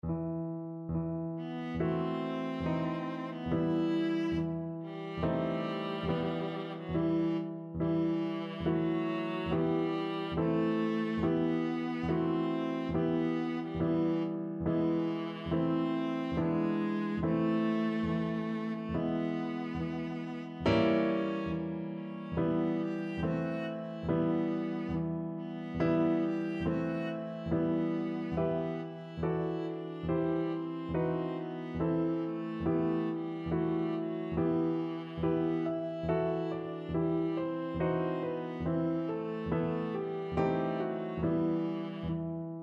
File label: evening bells, viola